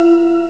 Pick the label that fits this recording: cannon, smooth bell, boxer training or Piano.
smooth bell